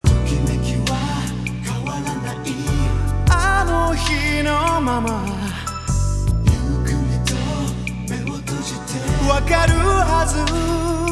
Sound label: Heartbeats